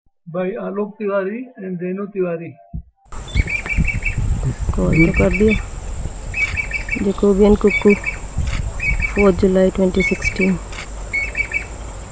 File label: Jacobin cuckoo call; alok